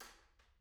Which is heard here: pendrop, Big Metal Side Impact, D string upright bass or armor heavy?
pendrop